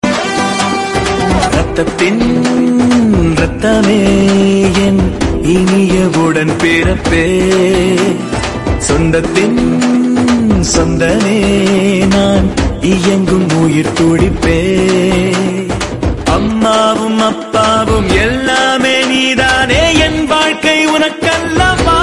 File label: Tamil Song Ringtone